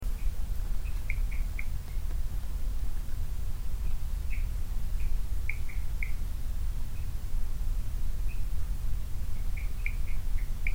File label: otter frogs birds